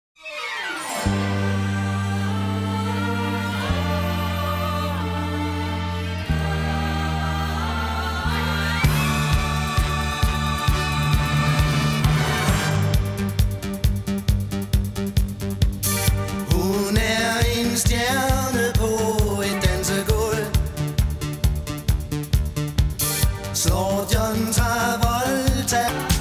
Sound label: Disco Tango